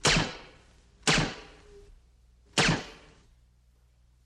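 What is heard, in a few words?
pistol shots ping silence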